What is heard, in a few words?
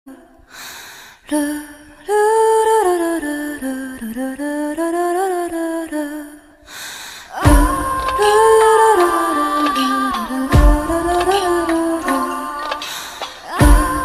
instrumental ringtone